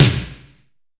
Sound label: big room acoustic snare sample c sharp key; snare drum samples